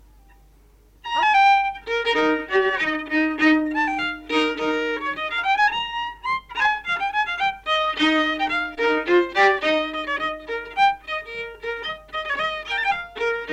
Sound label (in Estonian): lugu viiul folk; Ruhnu